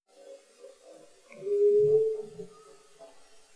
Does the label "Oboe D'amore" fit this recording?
No